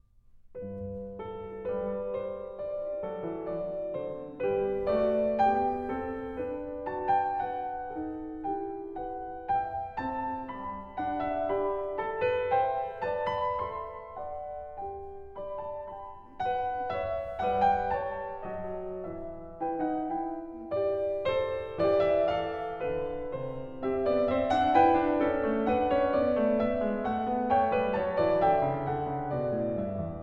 Bach Goldberg Variations BWV988 Variation 9 - Canone Alla Terza (Moderato)